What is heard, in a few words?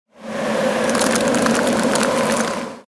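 snow leopards intro outro sound effects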